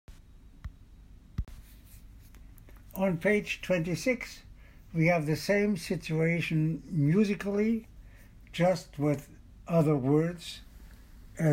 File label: BWV 117, only Sop.II